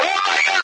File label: chatter, walla; video games; train; source engine; portal2